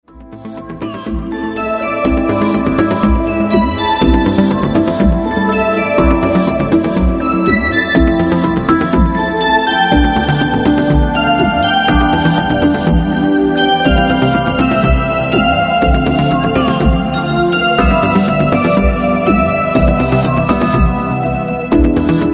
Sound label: dance club